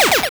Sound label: missile; space-war